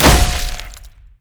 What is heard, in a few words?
basicattack; Yorick